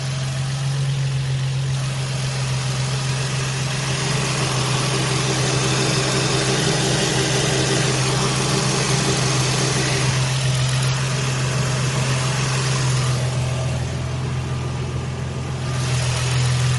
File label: g35 rattling timing chain issue, engine making rattling noise